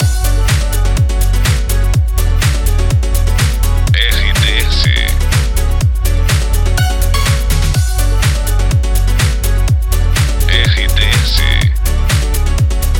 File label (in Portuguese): TRILHA PARA LOCUCAO; CLUBE FM PIRAPORA